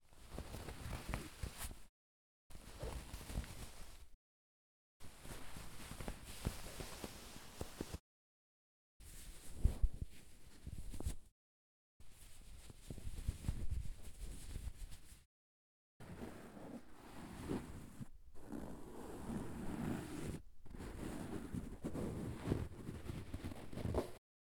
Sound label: Fabric